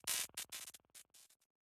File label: Boss Core Hurt Stinger; Avie